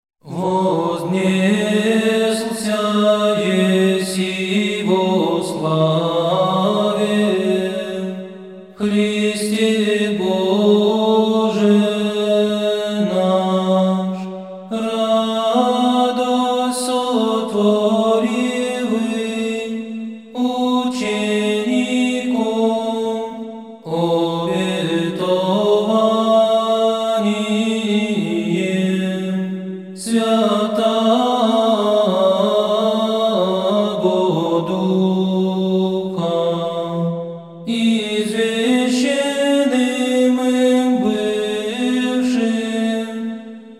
Hor bratii Valaamskogo Svyato Preobrazhenskogo monastyrya VOZNESENIE GOSPODNE Tropar glas